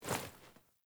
Foley Action Default Jump Land, Foley Default Clothing; Characters; Player